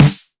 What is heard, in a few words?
puffy snare single hit D key